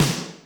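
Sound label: Snare Wood Shed, Alesis D4 drum machine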